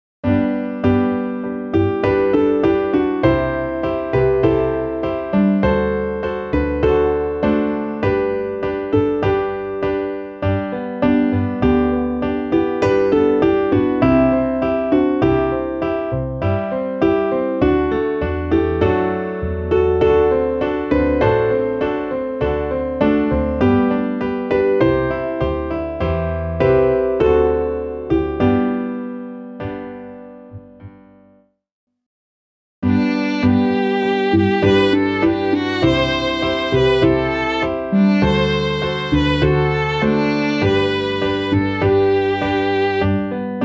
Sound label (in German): klavier; thueringer herbst